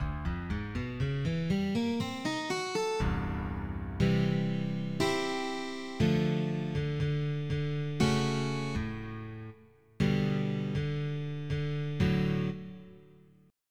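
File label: Acoustic Guitar - steel; FF7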